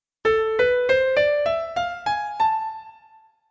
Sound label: A Harmonic Minor